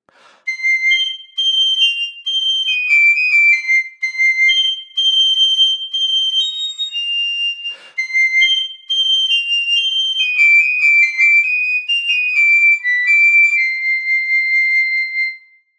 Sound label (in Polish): Flet piccolo